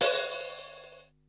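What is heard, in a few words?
hh mono open, Drum Machines, Yamaha Motif ES-Series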